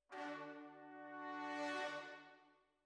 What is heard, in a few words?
Tromb ASS